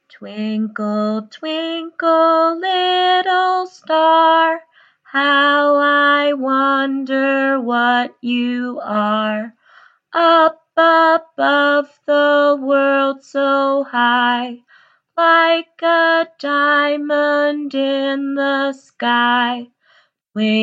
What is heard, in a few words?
twinkle twinkle, nursery rhymes